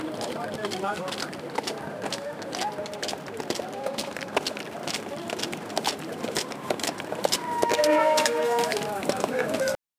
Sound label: ancient persepolis open, ceremonial